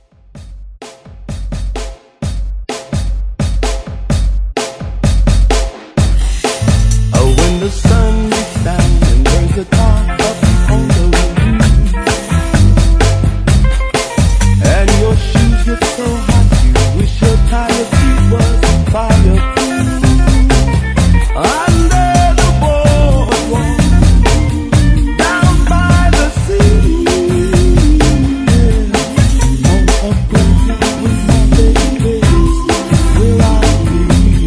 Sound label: 60s Soul Re-Drum 128